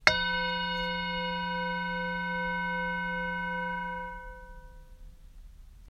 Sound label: bell long